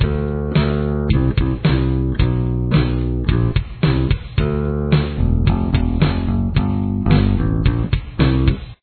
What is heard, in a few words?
verse bass